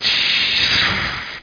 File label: P BURNED